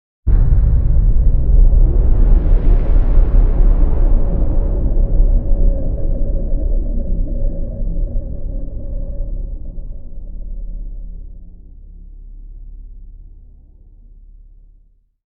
Boom Drone; Epic Tools vol. 1

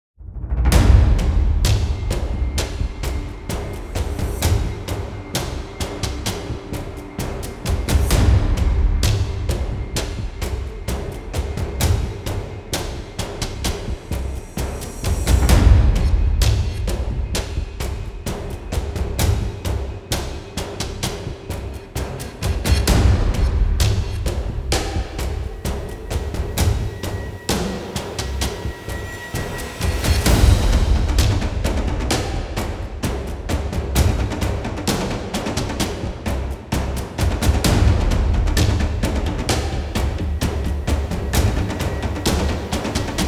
Percussion; Fired Earth Music

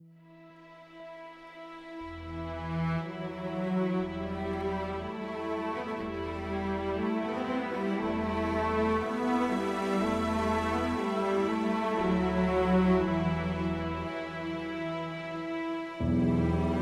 Sacred Ambient

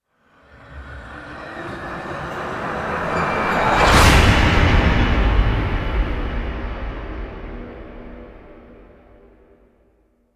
Warning Hit Swell; Ninja Tracks

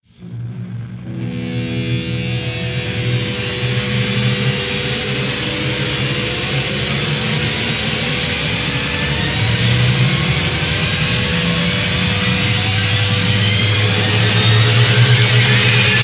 Distorted Reality, Risers; Gothic Storm Music

